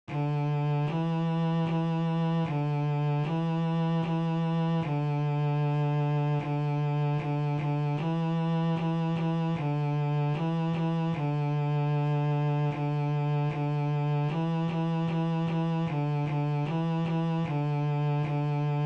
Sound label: D string 2 Notes, Bass; Bass for Camp